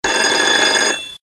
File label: RING SHORT; Golden Planet